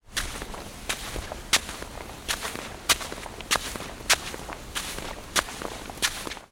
foley footsteps sand sandels shorts

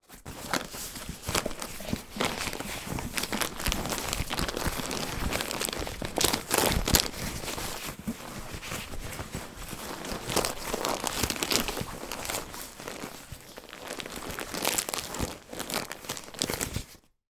foley bag baby changing rummage; sound effects one

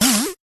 science fiction computer; Audio Mangler

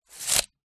tape measure metal release retract; industrial; zapsplat

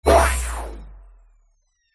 science fiction electricity spark surge; zapsplat

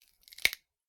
horror bone finger snap; sound effects one

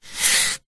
wood long branch pole slide hand; foley

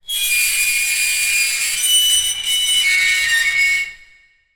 foley metal scrape shrill

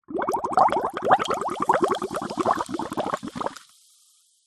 food drink bubbles blow straw soda glass fizz

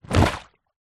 leisure lilo popped full of water place down

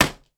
gerbil pet food bag drop on table; sound effects one

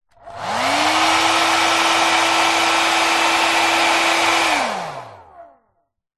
household hairdryer on off short close up

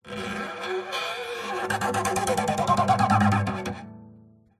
scratching knife on metal guitar string; Sound Ex Machina

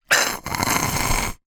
food drink straw suck air dregs soda

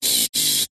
science fiction android servo robot movement; zapsplat

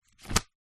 paper pad set down on table

